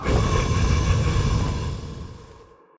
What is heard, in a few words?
reaperboss idle, monster